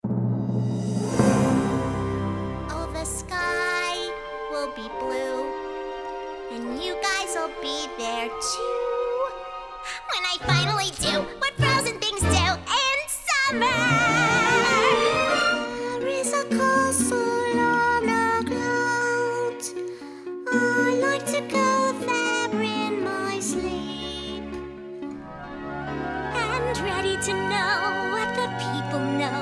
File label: character singing